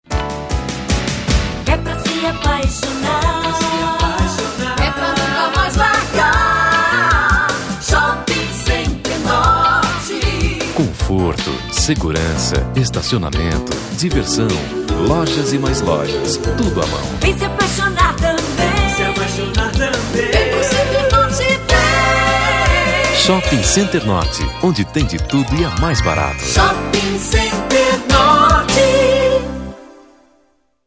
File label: JINGLE; SHOPPING CENTER NORTE